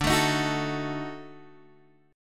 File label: Eb Minor Major 7th Flat 5th; EbmM7b5/D strummed